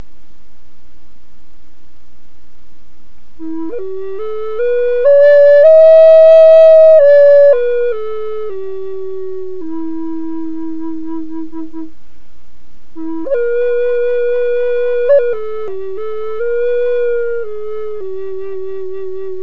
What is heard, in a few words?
E 1 bore Tulip, flutes